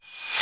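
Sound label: tambourine reverse right, tambourin; Big Beat Samples & More; AKAI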